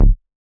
Squarehead Bass D

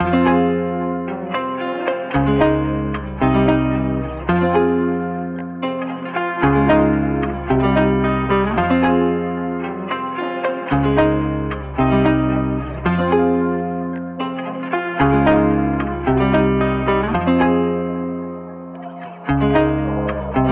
Journey Guitar Loops, 112 BPM F Min; Next Time; Cymatics

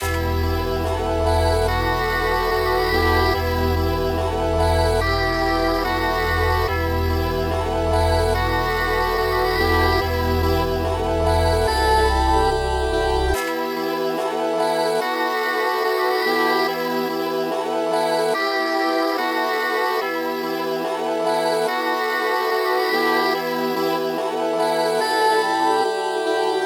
144 BPM E Min, Drill; Mortal Kombat

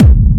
Techno Kick; 30 Techno Kicks 2025; Ghosthack

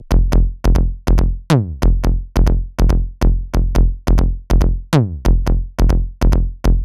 PSY Bass Loops F 140 BPM; FL Cloud

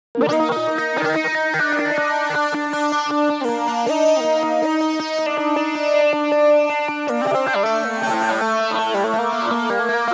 130 BPM G Min Lead, Synth; Melody Loops; Synth Collection; Cymatics; ANALOG EVOLUTION Beta

